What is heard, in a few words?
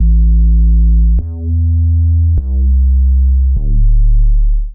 808 Boom Kit groove 101 bpm; MIDI Clips; Ableton; Factory Packs; Beat Tools